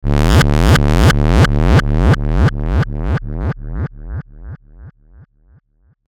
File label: Sweeper Bass Distortion Delay; Production Element Imaging Element Accent Transition